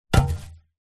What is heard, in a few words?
industrial shovel metal drop or set down grass